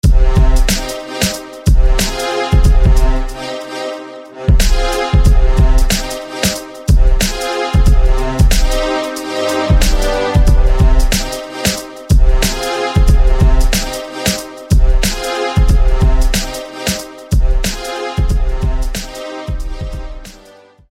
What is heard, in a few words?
Strong Short Brass; SFB FX